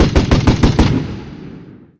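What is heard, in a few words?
machine gun heavy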